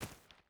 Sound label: footstep barefoot stones run; Rust